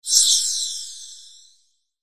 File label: Whoosh Soft Flange; Blastwave